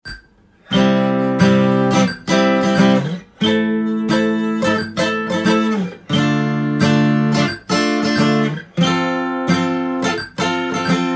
hi chord, guitar

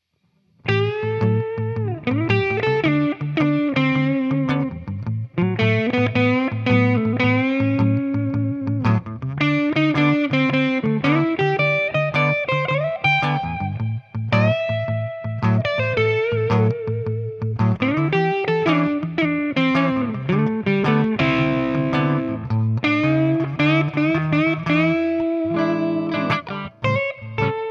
Blues solo

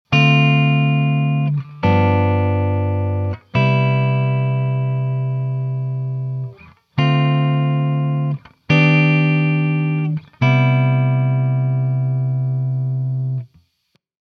guitar

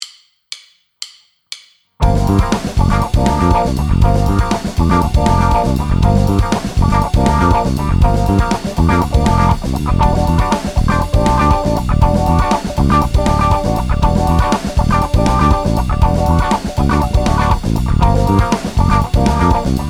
karaoke

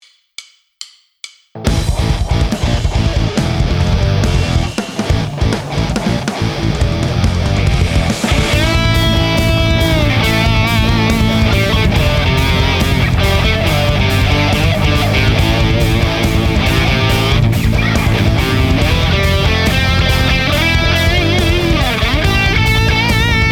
metal guitar solo penta

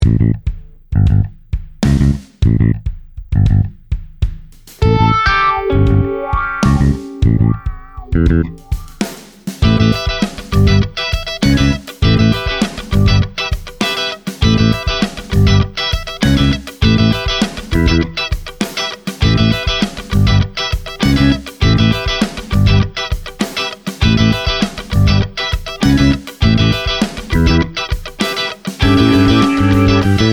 Funk karaoke

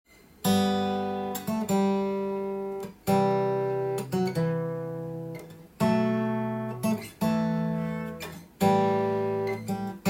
solo guitar; grace

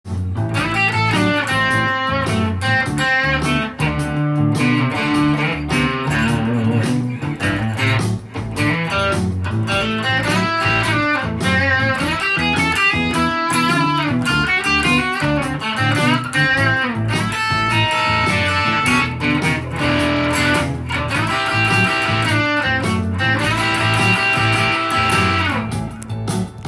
delay; japanese-made guitar effects with multi-effector

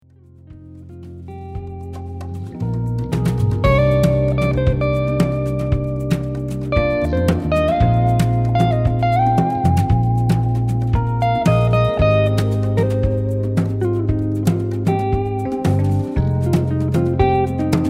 jazz funk solo, guitar

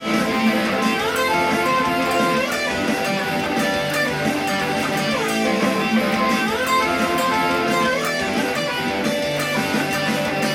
solo

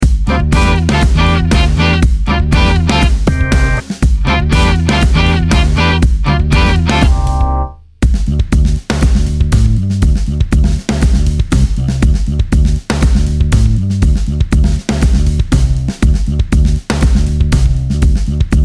D dorian song karaoke

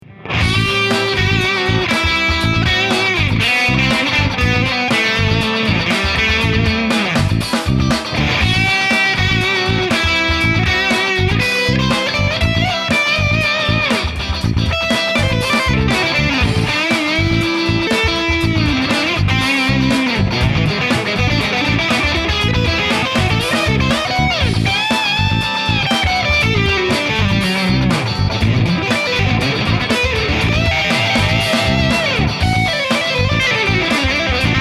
Am si pu solo

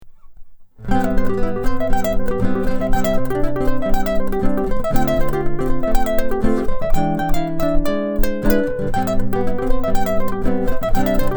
picking

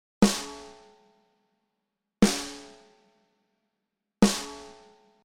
gost drums

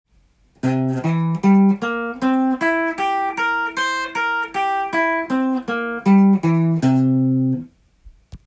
c7 chord tone, guitar

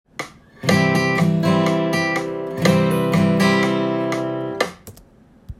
second guitar

